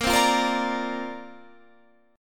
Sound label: D-Minor 7th Sharp 5th A sharp, chord